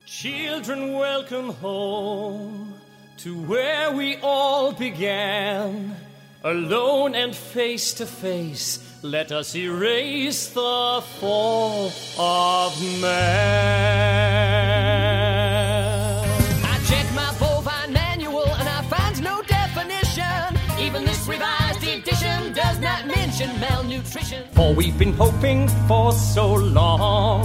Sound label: Singing